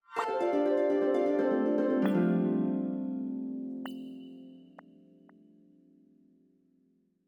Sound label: Medallion Fanfare; StickerClone